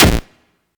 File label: wrong selection; steamcommunity; towerattack; SteamMonsterGame